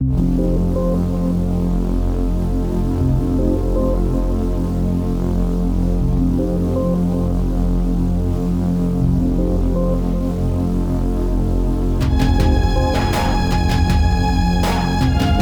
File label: music ingame; It Came From Space and Ate Our Brains